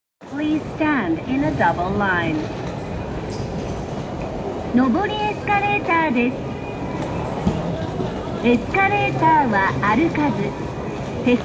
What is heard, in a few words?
escalator